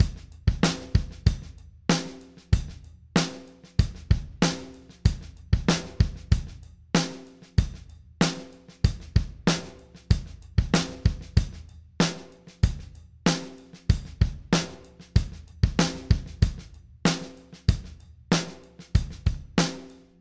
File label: dunnett kit; toontrack the warehouse rock sdx